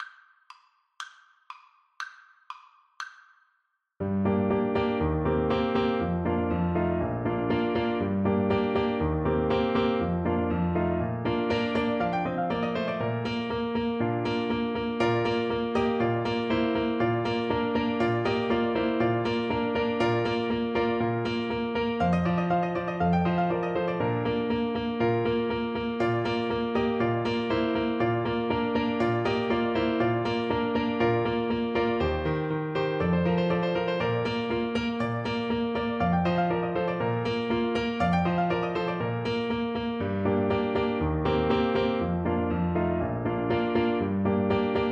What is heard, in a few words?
janitscharen, tuba